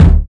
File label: natural bass drum sound D sharp key; kick drum